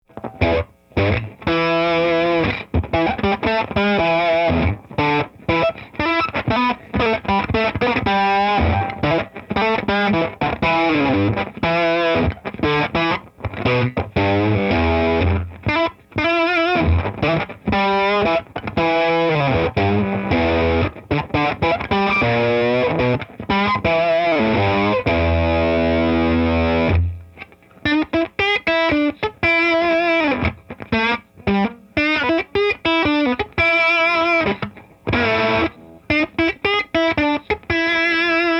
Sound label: LP blues